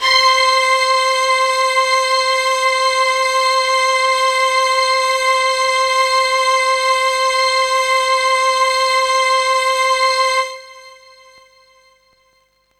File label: Synths HiString C; Vengeance Samples